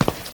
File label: Footstep rock; this is the zodiac speaking 2020 gamerip switch ps4 xbox one pc macos